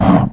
zombie hit; mobs; mobs redo

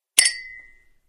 glass block; BobBlocks

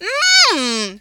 mobs hit; server-nalc

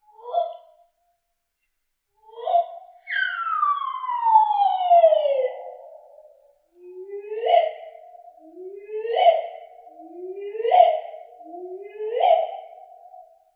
solitary bird song; ambience; dfcaverns

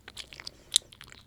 mushy chew; dfcaverns; farming

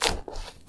fungus footstep; dfcaverns; trees

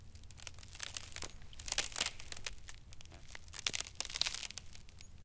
ice crack; ambience

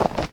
dirt footstep; minetestforfun game

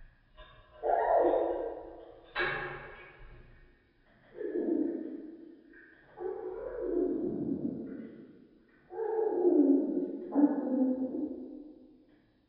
bird budgie song; dfcaverns; df ambience

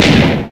shell explode

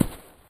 default place node; nalc game

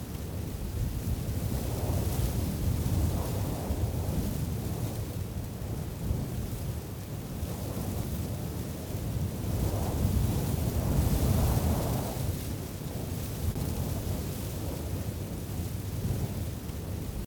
dry grass in wind; Ambience

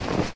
snow footstep; minetestforfun game; nalc server

